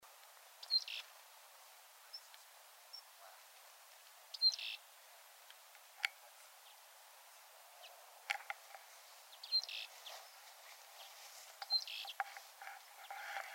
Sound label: Black capped Chickadee Poecile atricapillus